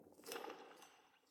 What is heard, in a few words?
Crowd Individual SFX Footsteps; Silence Incarnate